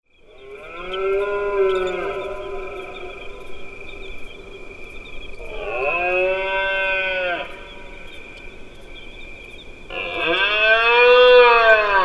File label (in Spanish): Berrea de Ciervo